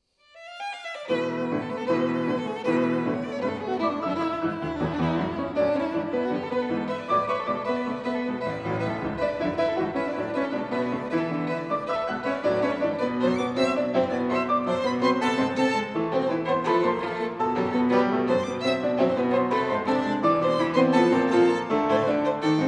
Rhapsody - Accelerando - A Tempo, Violin And Piano; Rhapsody No. 1, BB 94a (Alternative Ending); (Quarter Note Equals 168); Bartok - Works For Violin And Piano